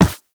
Operator Run Metal, Footsteps; Warframe

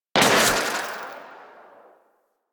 Crossbow Whoosh, weapons; Garuda

